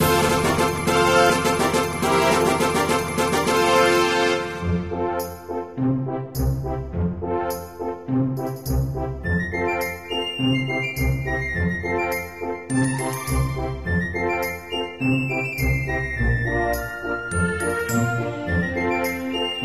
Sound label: Town; MapleShrine